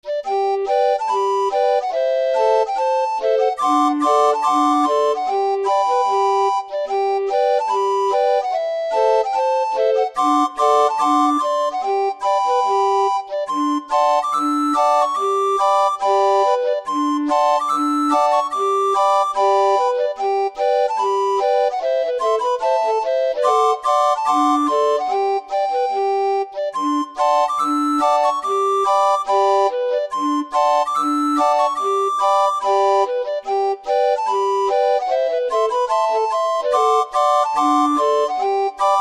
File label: Gdy Narod Do Boju, orgue barbarie